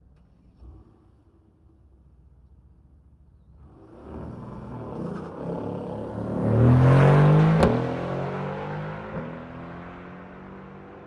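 mercedes amg gtr exterior start fast away by up stop off wide; mkh8060; Sonniss GDC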